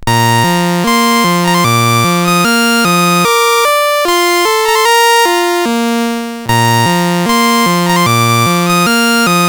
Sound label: sid CSG8580R5